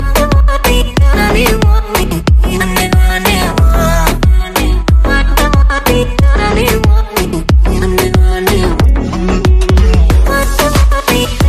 Music Ringtone

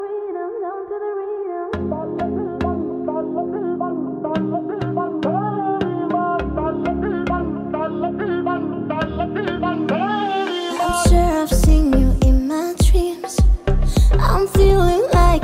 English Ringtone

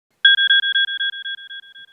iPhone Sonar Message Ringtone

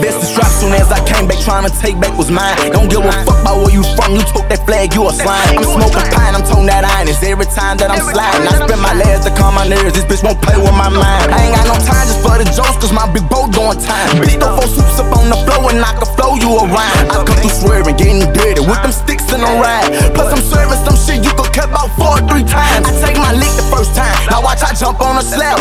Baby English Ringtone Rap Song

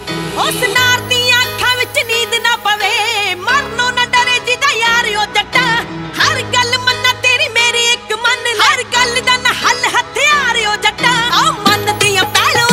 Latest Female Verazion Ringtone

